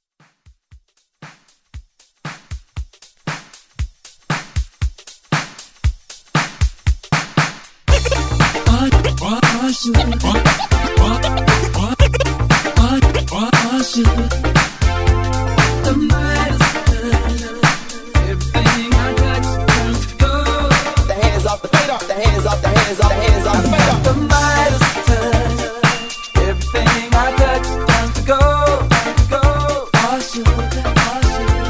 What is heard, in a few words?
80s Redrum